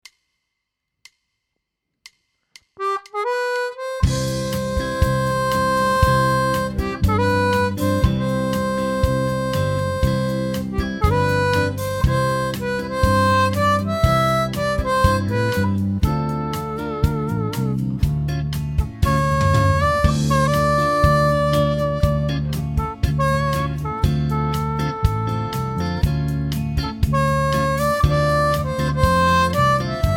120 harp

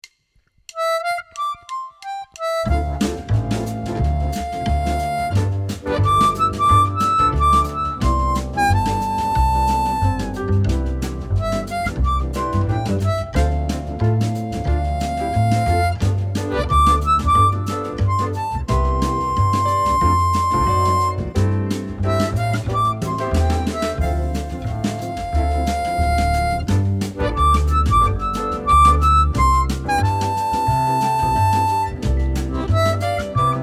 C 180 harp

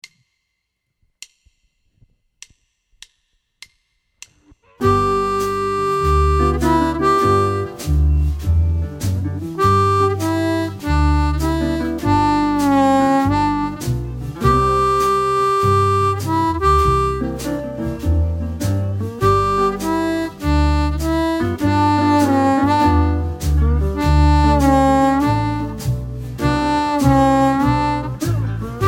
Blues 100 harp

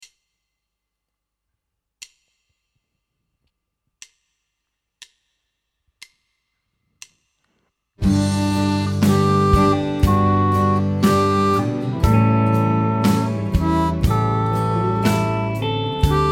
G 60 harp

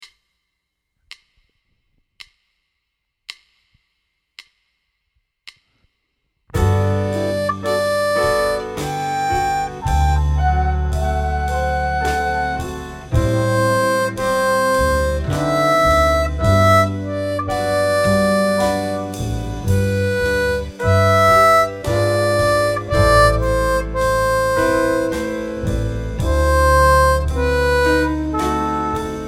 Santa Lucia G 55 harp

